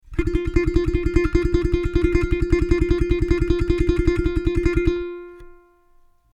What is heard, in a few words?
tremolo, ukulele